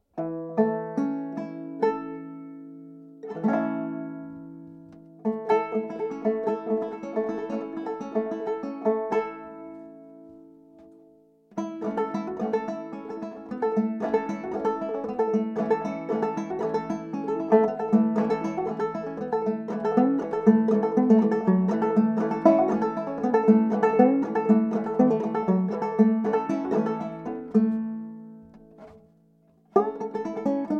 fretless, banjo

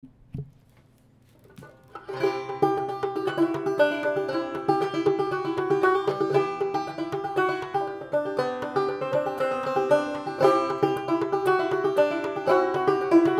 wooden tub

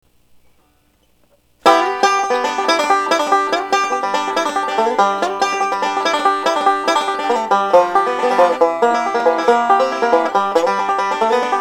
pick along, banjo

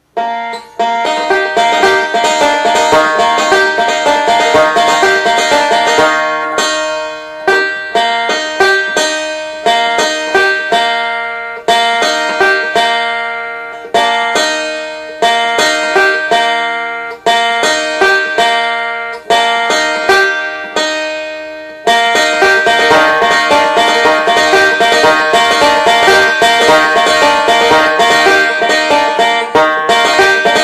picking, banjo

